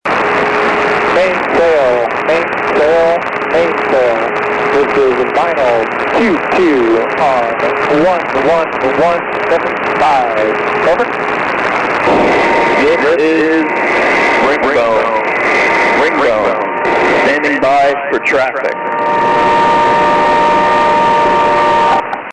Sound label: vinyl